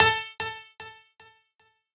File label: piano sabi